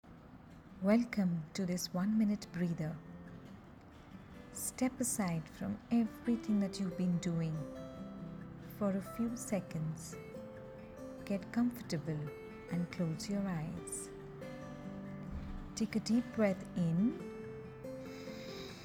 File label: breathing